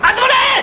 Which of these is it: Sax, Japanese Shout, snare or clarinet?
Japanese Shout